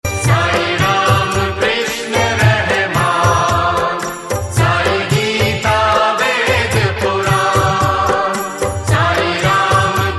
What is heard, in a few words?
RINGTONE; BHAKTI